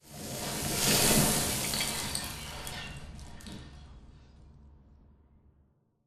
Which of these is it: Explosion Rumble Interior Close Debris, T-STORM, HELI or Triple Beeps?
Explosion Rumble Interior Close Debris